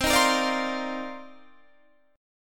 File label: C Minor 11th C Guitar Standard; strummed; JGuitar